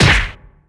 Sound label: Hit Weapon Hand